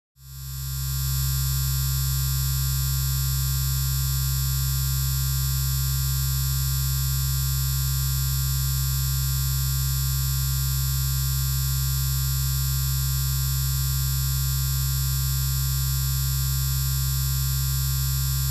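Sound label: Ballast Hum Low Fluorescent; buzz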